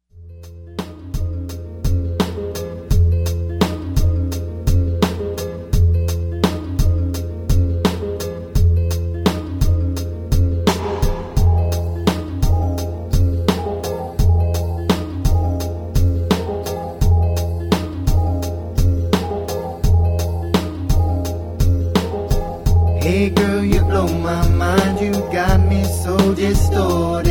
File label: Distorted